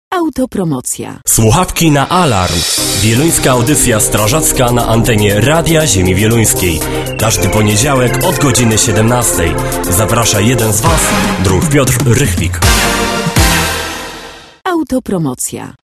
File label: Alarm; audycja